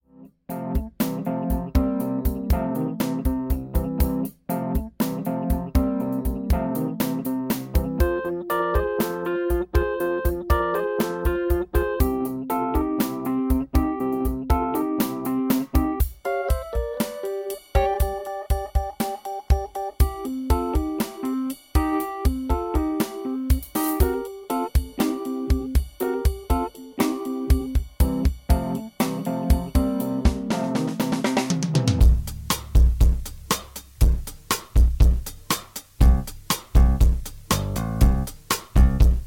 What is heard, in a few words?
Blues Touch